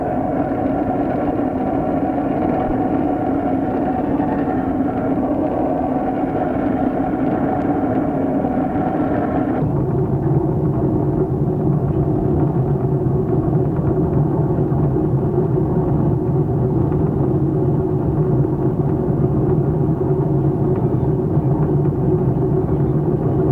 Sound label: tunnellll